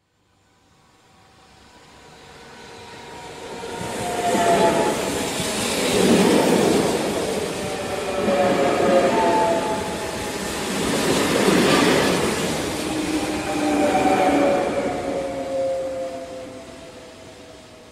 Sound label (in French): bruit train qui passe; le lexique des moyens de transport